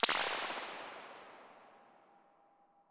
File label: Rack Spring Dark, impulse responses, spring reverbs; Medium Spaces; Apple